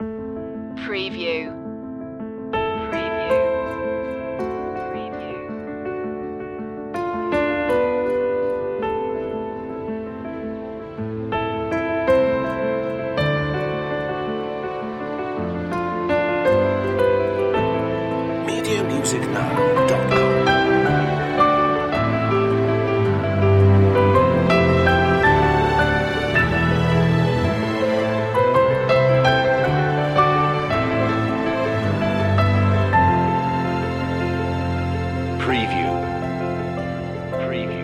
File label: the moving piano